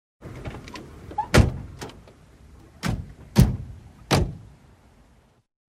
Mesa sygoinwnias Porta Autokinhtoy

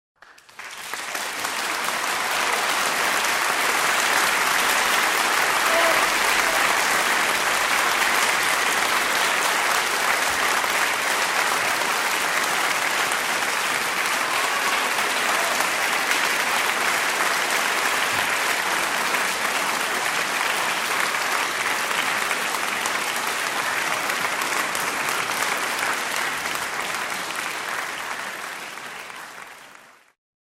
Anthropoi Xeirokrothmata

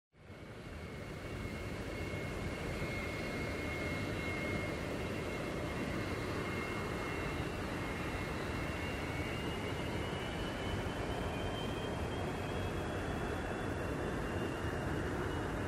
Mesa sygoinwnias Aeroplano

